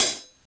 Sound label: foot cymbal B key, pedal hi-hat single hit tuned to the B note. Loudest frequency: 10707Hz; hat samples